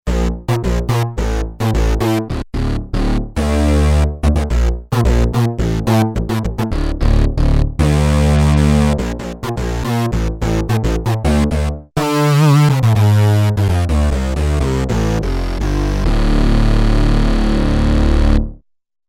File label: SYNTH BASS, Korg DW-8000; Factory Patches